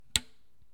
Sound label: Door latch click